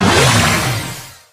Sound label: mech crow ulti; Brawl Stars